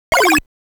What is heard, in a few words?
Tractor Beam Attack; game sound effect; Pac & Pal